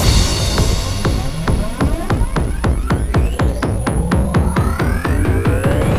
Music Stings Transition

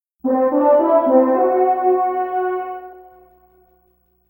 Dragon Horn; Shadowgate 64 Trials of the Four Towers

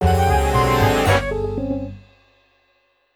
Jingle, Failure; Uniform Distribution

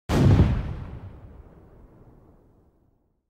handcannon shot with barrel; Out of Reach: Treasure Royale

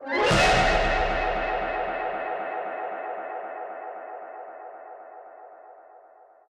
Park Dogs Brass

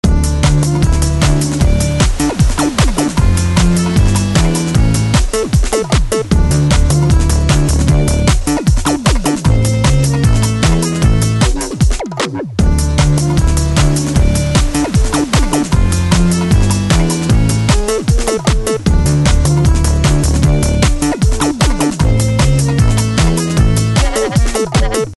Shore Booty - Loop; Chaos Faction 2

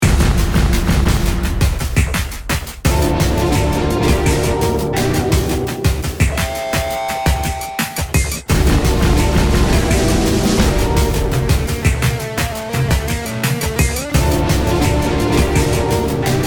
Music Loop; battle bears 1

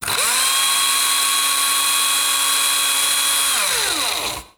DRILL

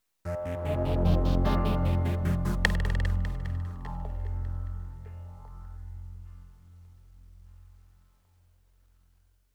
Oneshot Synth And Perc; zombie army 4 dead war ps4 stadia switch windows xbox one gamerip 2020

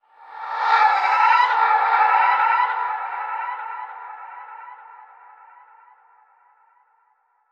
ghostly shriek dark

